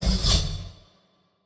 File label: history lines; DraculasCastleWD; wazdan